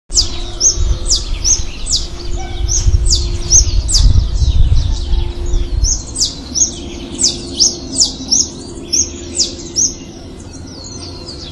Thraupis bonariensis; General Acha

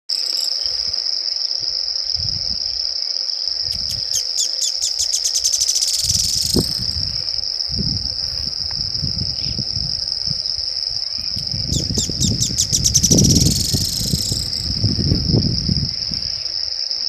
Limnoctites rectirostris

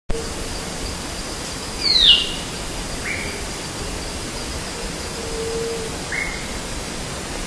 Pipra fasciicauda y Chamaeza campanisona

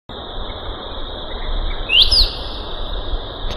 Cacicus chrysopterus; PP Salto Encantado

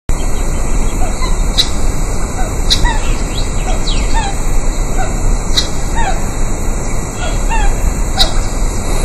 Gallinula melanops; Ceibas

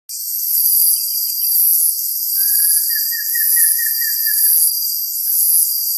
Batara cinerea; Corredor Biologico U F

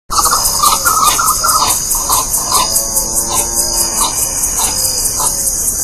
Crotophaga major

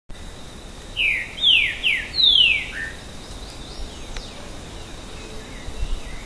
Saltator similis; PN Chaco

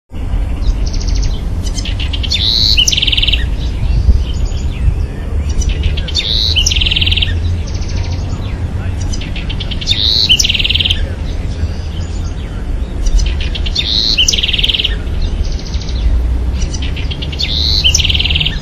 Troglodytes aedon; RN Vicente Lopez

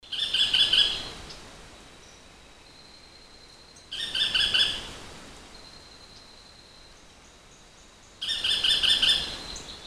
Automolus leucophtalmus

